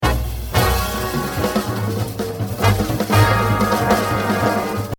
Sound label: Exercise, jazz drumming; The Evolution of Jazz Drumming (Gottlieb)